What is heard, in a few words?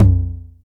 low floor tr 909 synth tom sample f key, Royality free tr 909 electronic tom sample; tom drum samples